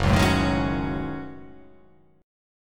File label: Gb Minor 6th Add 9th Gb down; strummed; JGuitar